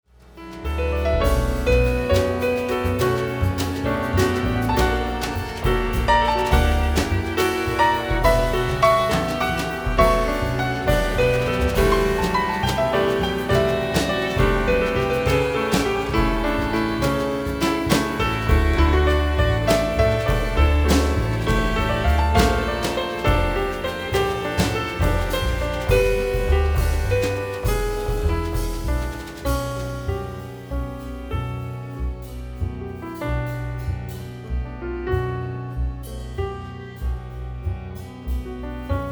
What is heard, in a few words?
Jazz